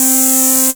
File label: CREEPY A C3, SEQUENCER; Best Service Alpha Dance vol.2